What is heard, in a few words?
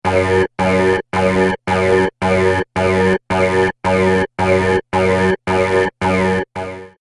Overload Alarm